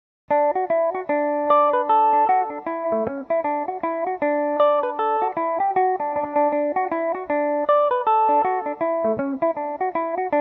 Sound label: humours of newcastle dmaj, mandolin